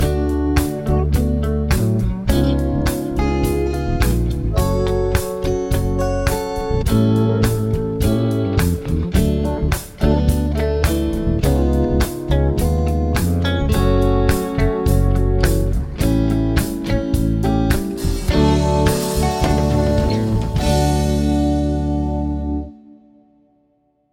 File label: progs with applied chords; harmony